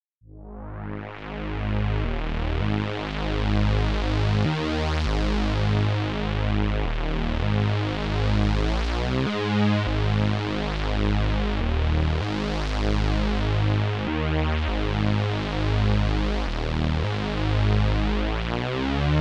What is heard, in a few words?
Bass 100 BPM Dbmaj